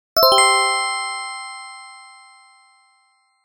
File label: you would be glad